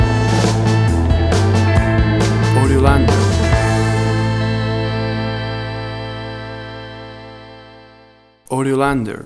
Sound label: 60s Surf Ballad Music Stinger